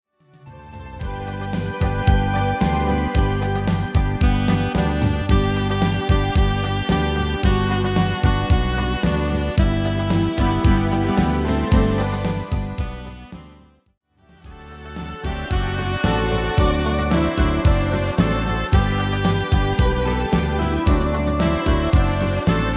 Rumba

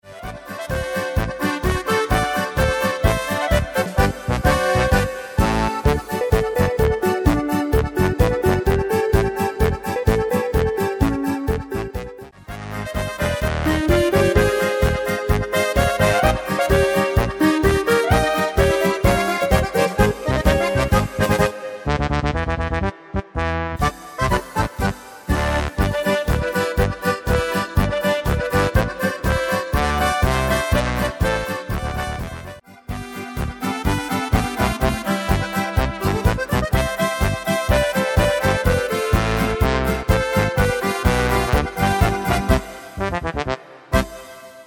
Oberkrainer Polka

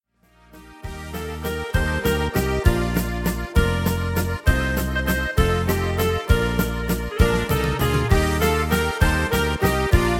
Schunkel Klatsch

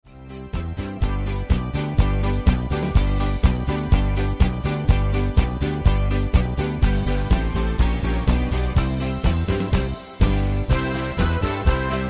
Polonaise

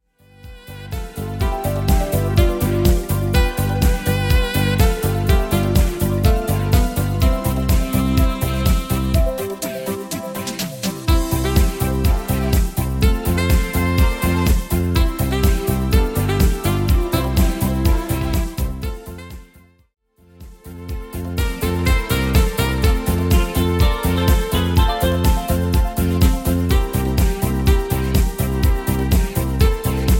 Discofox; Deutsche Oldies

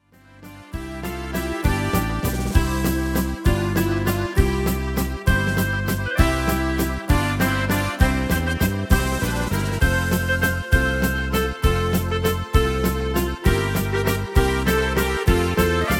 Schunkel Klatsch

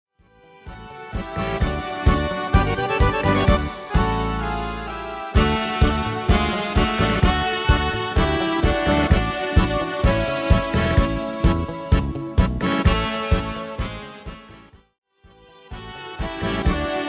Tango

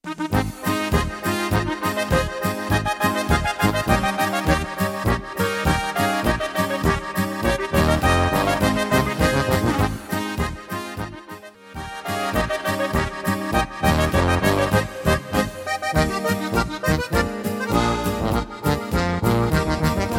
Boarischer

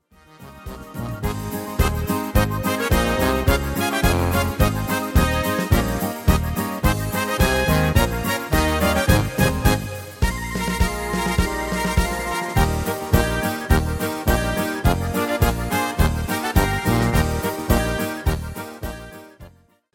Marsch

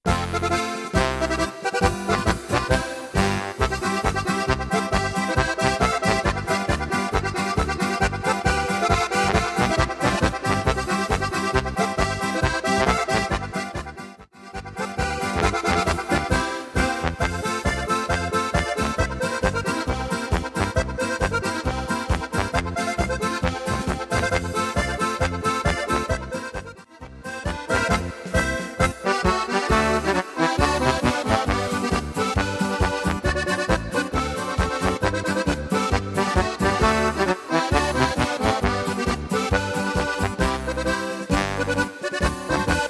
Marsch

